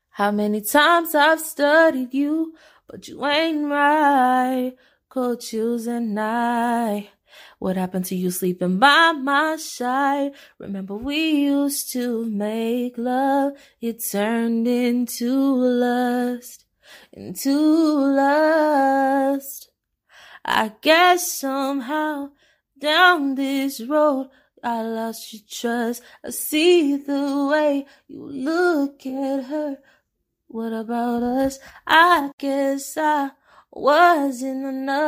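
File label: pop, 116, B flat minor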